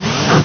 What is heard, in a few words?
sfx chat open